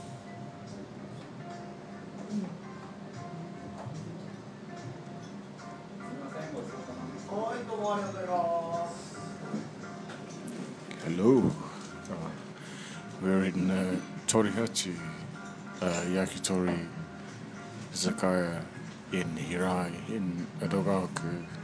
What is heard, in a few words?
jazz moaning august night